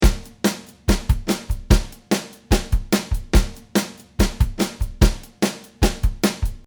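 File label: Basic Soul, 145 bpm; Apple Loops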